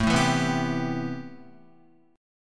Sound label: A-Minor 9th A Guitar; strummed; JGuitar